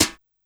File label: Brush Slap, Yamaha PSR-E323, Brush Kit